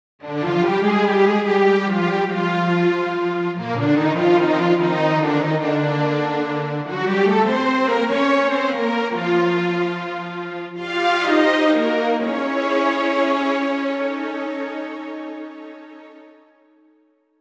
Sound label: Strings ALL